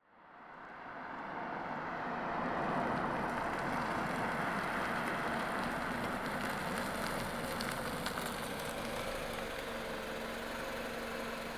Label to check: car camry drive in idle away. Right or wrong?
Right